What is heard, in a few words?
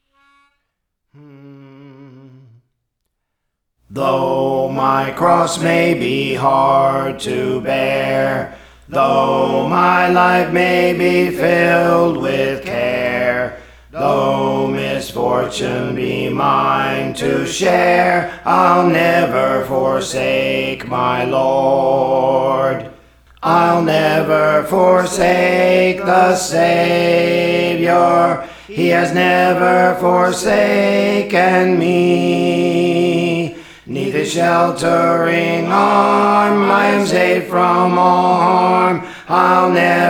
Alto; Praise For The Lord Training